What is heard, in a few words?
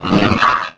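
droid; Star Wars Knights Of The Old Republic I; XBOX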